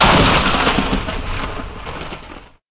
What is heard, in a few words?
Thunder; ImpGal2; groundwar